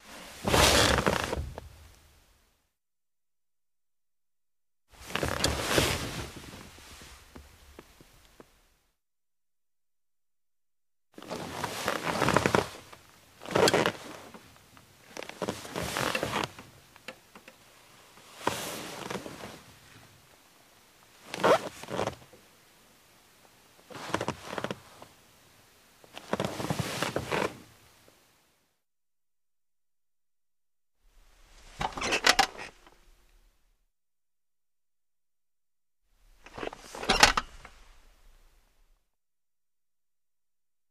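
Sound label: Chairs Leather Office, chair movement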